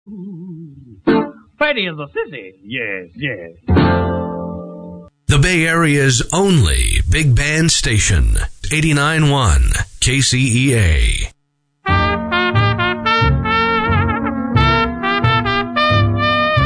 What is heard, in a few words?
Dixielanders